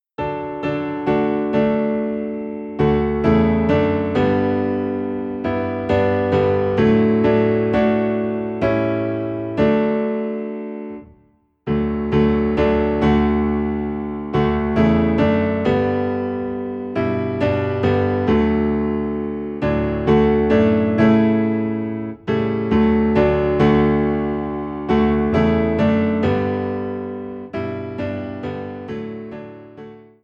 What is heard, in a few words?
Piano Low in D; Higher Ground